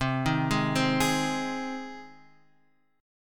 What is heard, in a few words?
C Minor Sharp 5th, Guitar, Standard; arpeggiated; JGuitar